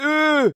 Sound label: euuuuh